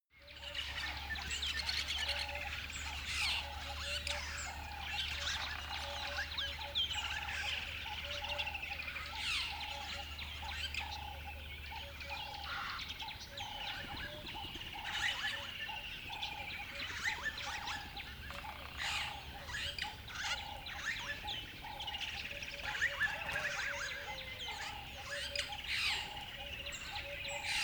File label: river bed dawn birds bedlam